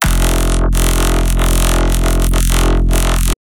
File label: dubstep bass; wavefield